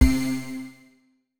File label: ready; Steven Universe - Beach City Drifters